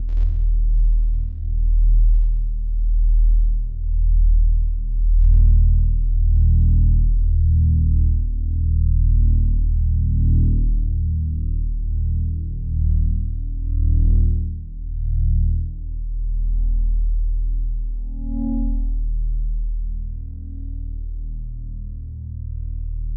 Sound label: Deep Loop; neon valley revenge unleashed